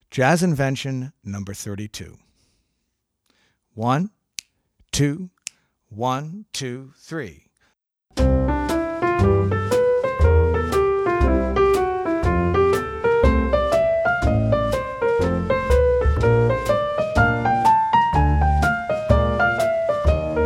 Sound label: Jazz Invention No. 2